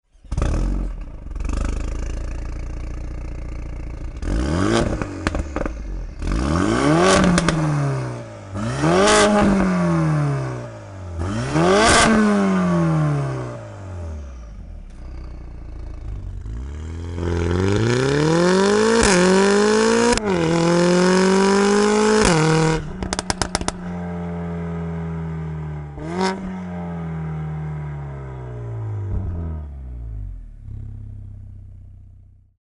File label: DOWNPIPE MERCEDES AMG A45 W177